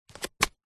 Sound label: wallet leather card insert; foley; zapsplat